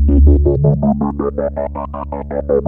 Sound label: 3100 DP C#3, Korg PS3100; Back In Time Records; Korg Universe VOL-1